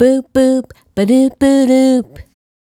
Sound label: Doodooba 085, Swingin' Chick Loops & Lix